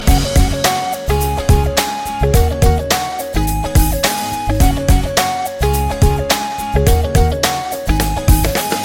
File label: sweet guitar